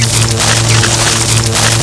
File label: shoot loop, weapons